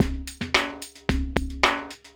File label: 70s breakbeats loop; USB Soundscan vol.46; AKAI